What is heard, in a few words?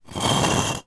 breeze block scrape on hard ground; foley